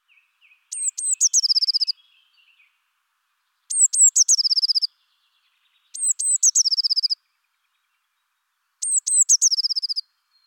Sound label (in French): Mesange bleue